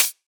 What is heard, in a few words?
Dubstep Hihat, Closed; Drums; One Shots; Cymbals